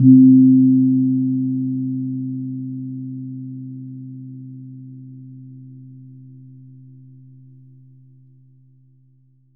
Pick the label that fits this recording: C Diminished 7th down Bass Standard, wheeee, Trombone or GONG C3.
GONG C3